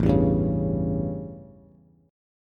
A 7th Bass, chord; strummed; JGuitar

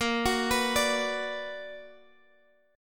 B Minor Major 7th Bb, chord

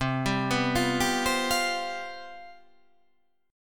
C Major 7th Suspended 4th

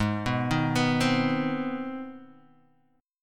C Minor Major 7th Sharp 5th Ab

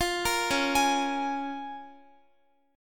Db 6th, Guitar; arpeggiated; x,x,15,15,13,15

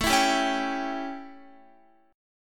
A 7th A, Guitar; A7 strummed; x,12,14,12,13,14; 4E,4A,5D,5G,5C,6F

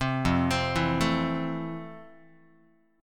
F Major 7th F, Guitar; arpeggiated; JGuitar

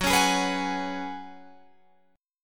Gb Suspended 2nd Suspended 4th, Guitar, Drop D

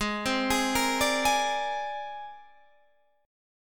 C 7th Suspended 2nd Sharp 5th Ab

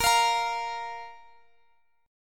A sharp 5th

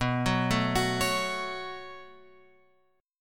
B 7th Suspended 2nd; B7sus2 Chord {7 9 7 X 7 9} arpeggiated

